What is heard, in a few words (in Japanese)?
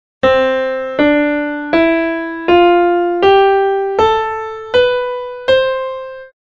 Ｃメジャースケール